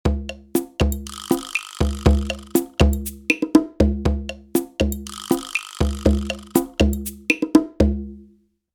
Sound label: drumloop dry